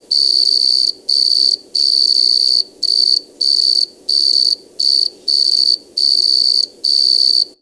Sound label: bug cricket loop